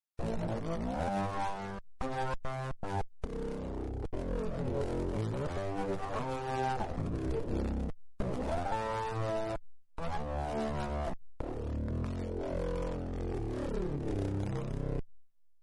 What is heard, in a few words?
Spitty Grit, Nord